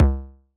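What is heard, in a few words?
Bass Drum Analog Distortion; Yamaha DJX-2B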